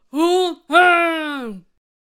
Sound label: Righteous Paladin Attack Vocals